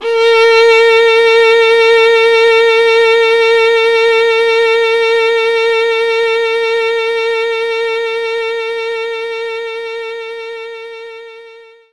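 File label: Violin A#4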